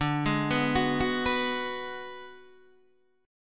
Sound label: D 6th Flat 5th, Guitar DADGAD